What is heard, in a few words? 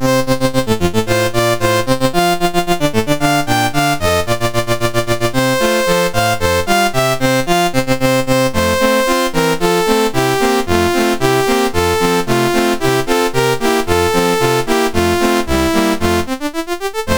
Deck the Halls, MOS6581R4